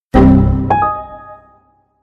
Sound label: windows sms; narodnoe-windows-sms